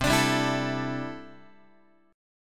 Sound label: C 6th Add 9th, C, down, Guitar; strummed; 4A,4E,5A,5D,5G#,6C#; chord; JGuitar